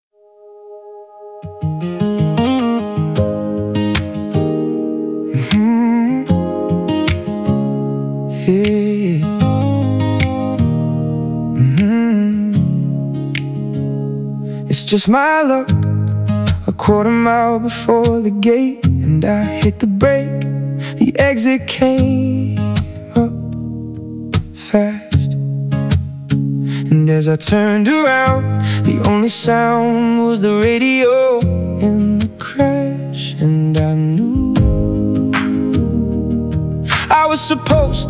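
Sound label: singer songwriter streaming loud; transientik master; transientik labs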